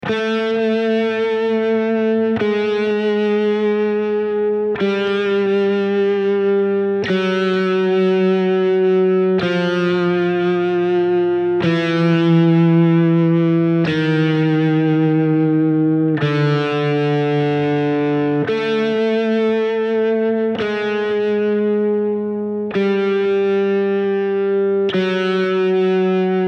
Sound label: Descending Guitar Line Rock Guitar Riff